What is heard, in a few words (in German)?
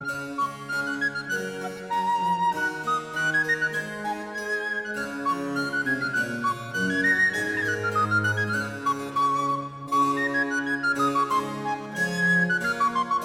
sopranblockfloete; drei stuecke unbekannter meister aus tuitnement kabinet